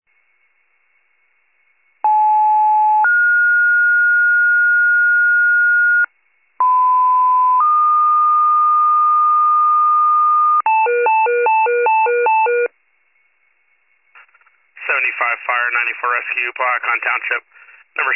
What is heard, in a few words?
FIRE pager alert